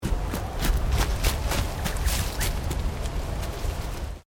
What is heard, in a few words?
Bird Emu Running, Birds in Queensland; Birds (Sound Effects:Animals)